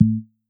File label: error